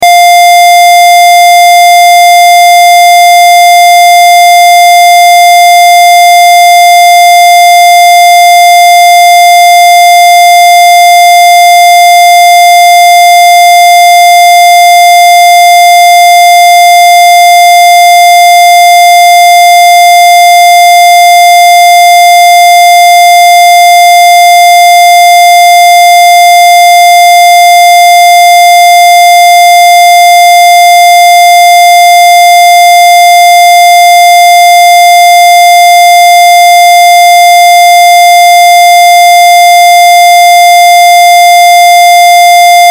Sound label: Test Tone Square; Square Wave 700Hz 60 Secs 0Db Loop; Technology; Blastwave